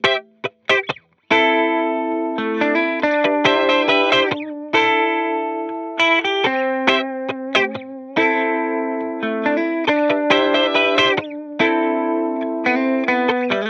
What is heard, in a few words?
guitar loop 140 Am; Pop; Guitar Stacks; SD PASADENA Pop Sample Pack; Slate